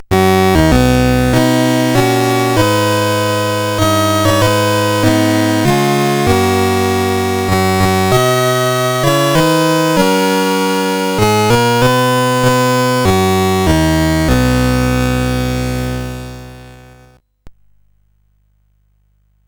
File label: sid, CSG8580R5; Battle of Midway